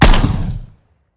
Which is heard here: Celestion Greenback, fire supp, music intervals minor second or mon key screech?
fire supp